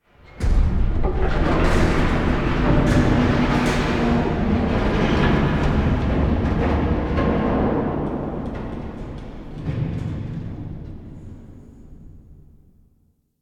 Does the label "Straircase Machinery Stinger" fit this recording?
Yes